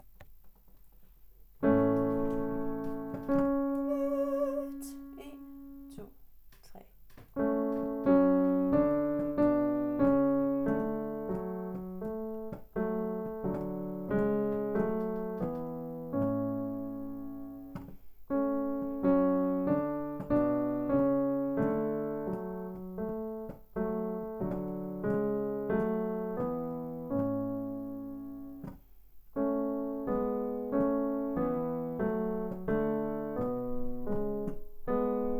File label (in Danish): TENOR uden sang